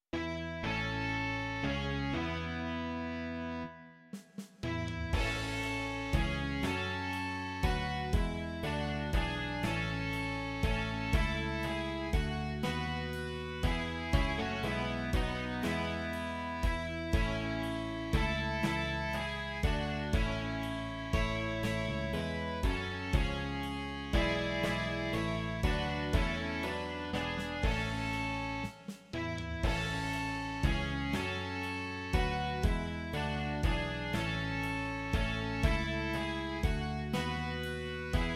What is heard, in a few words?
rock